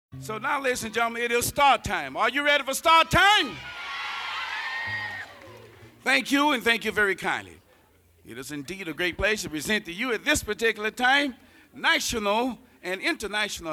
Opening Fanfare, Live

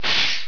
trucks; GRSITES Graphics

